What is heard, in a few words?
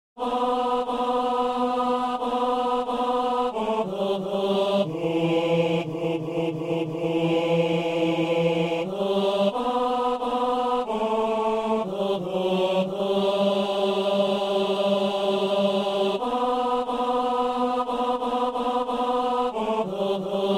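tenor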